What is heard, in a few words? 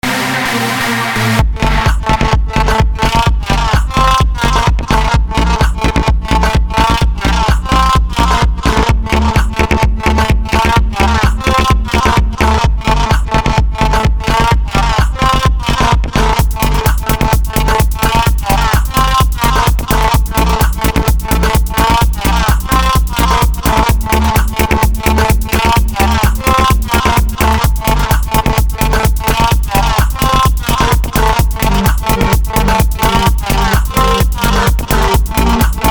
Techno